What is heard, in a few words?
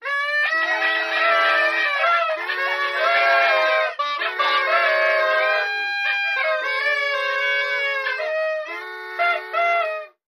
Horns 4 Group Party Ne